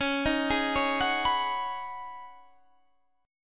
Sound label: C sharp 7th Suspended 2nd Suspended 4th C sharp down Guitar Standard; arpeggiated; capo 9